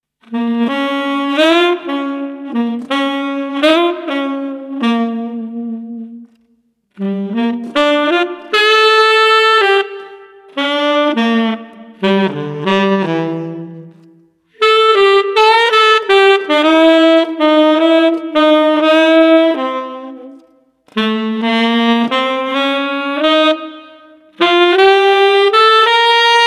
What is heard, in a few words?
Street Sax Relaxed